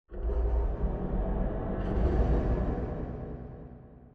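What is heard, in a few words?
Robot Arm Angle Up Distant; Boomtown; Titanfall 2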